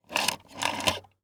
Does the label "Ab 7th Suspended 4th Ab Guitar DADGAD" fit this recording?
No